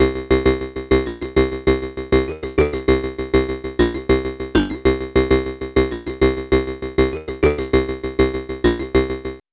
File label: Xpander, R; AMG - Vince Clarke Lucky Bastard